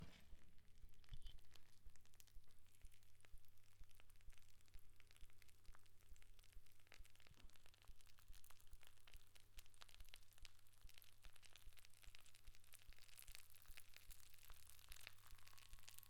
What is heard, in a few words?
Bacon